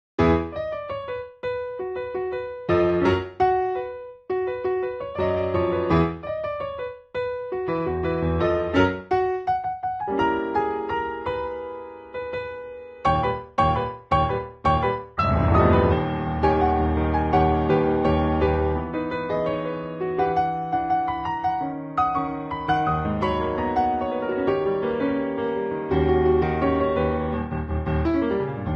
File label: piano ver.